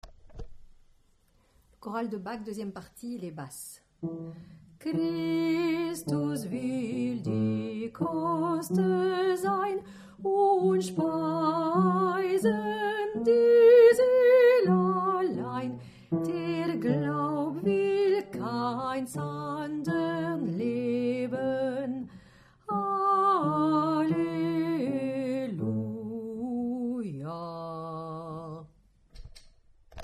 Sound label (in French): Basse